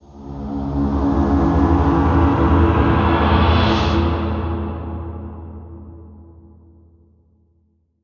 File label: Hit Tonal Threatening